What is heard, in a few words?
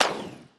Gun Zap